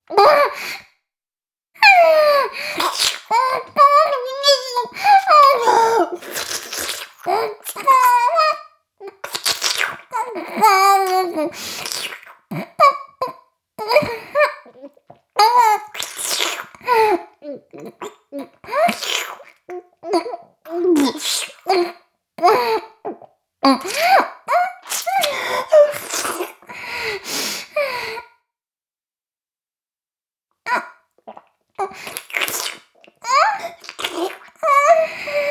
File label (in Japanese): フェラ音声; おにいちゃんアイスぅー！